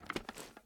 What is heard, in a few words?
reload start, weapons; xmag; gmod; viper